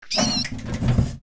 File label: Animal Trapped wood; Project Zomboid v41.73